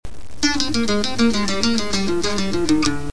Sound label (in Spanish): Me hace llorar intro, bajo sexto y acordeon; Requinto